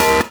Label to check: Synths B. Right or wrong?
Right